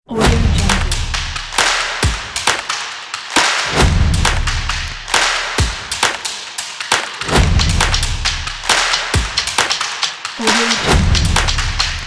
stomp logo

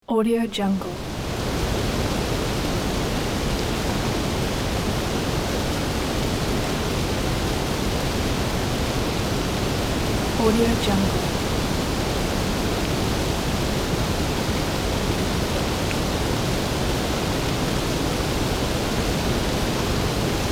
strong waterfall

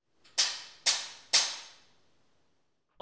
hitting glass with metal screw

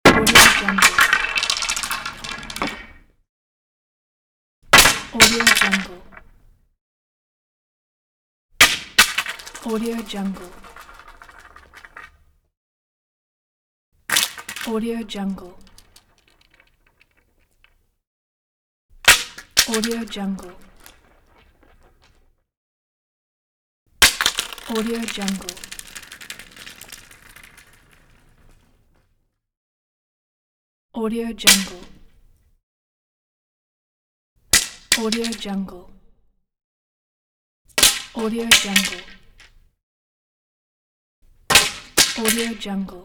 pool cue falling

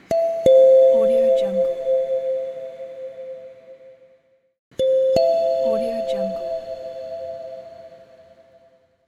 gentle indication sounds; sergeyyukon

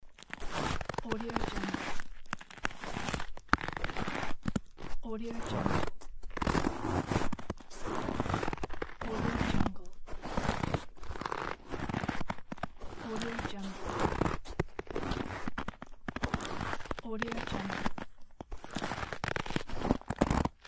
walking on snow

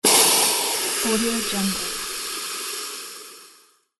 steam release

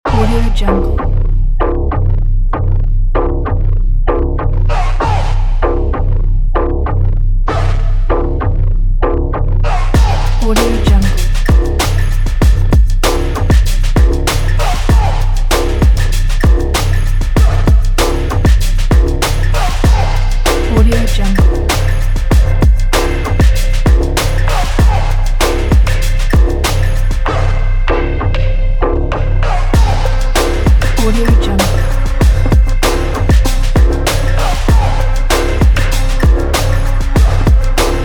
industrial beat for sport

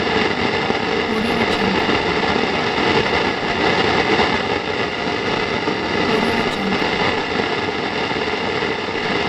antique tube radio static

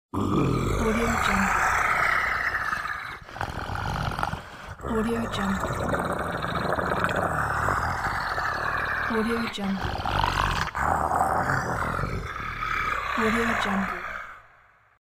monster zombie devil

